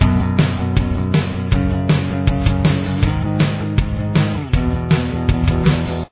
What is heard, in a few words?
pre-chorus bass